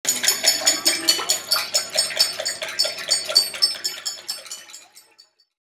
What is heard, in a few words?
rain